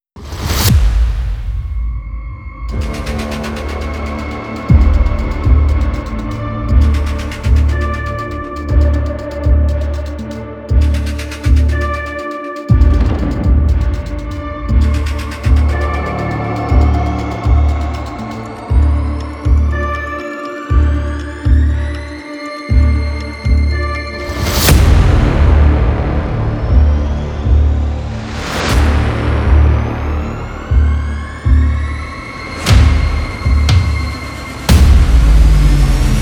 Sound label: tension nostrings; By Burak Sound Bank; tension gerilim vol 22